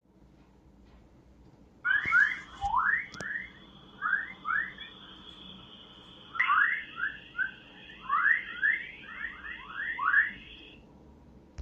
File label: chirping chorus